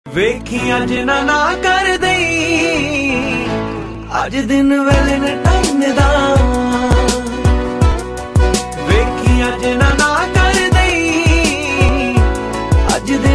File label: punjabi sad ringtone